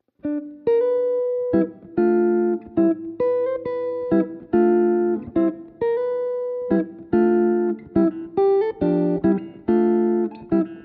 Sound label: Chord Melody; jazz tunes